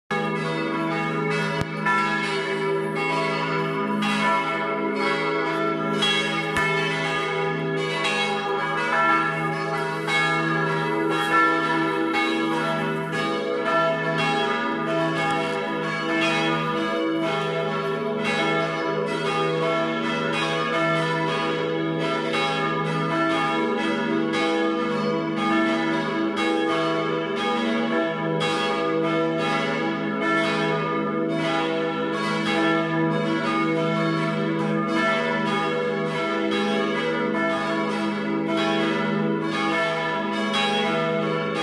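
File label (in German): Glockengeläute; Amsoldingen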